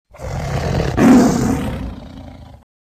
fauchen

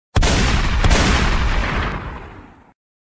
doppelter schuss

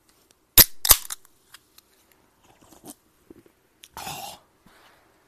schlurp

